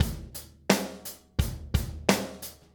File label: 087 Steady Grooves, Drums; Pop Rock; Moderate Tempo; Pro Tools Essential Loops; Digidesign